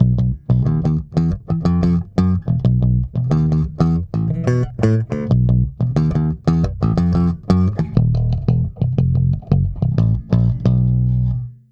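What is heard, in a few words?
TRBX preset pick, bass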